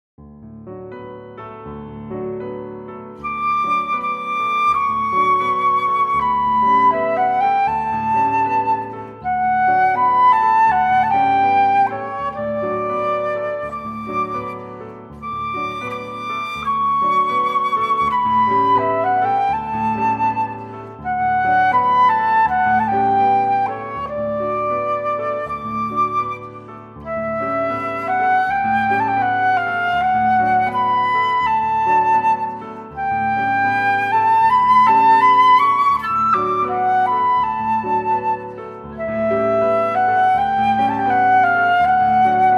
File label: Berceuse Full Flute